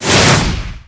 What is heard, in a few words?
Dragon Meteo Down